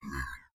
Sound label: Monster Strain; A Robot Named Fight